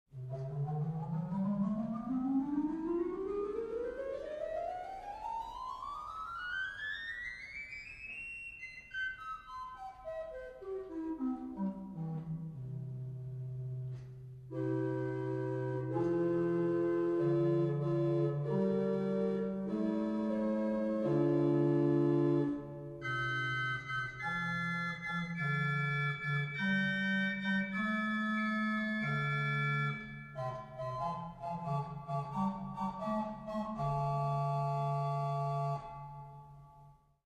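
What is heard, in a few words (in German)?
Rohrflöte 4; Schultheis Schlimbach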